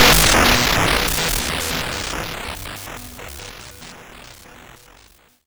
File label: FX Crashed; SFX; YM; ChipShop Deluxe; ChipShop Hits